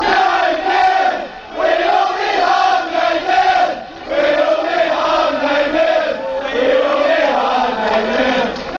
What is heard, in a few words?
9 men, fan chants